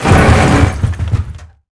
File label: hit cockpit metal; War Thunder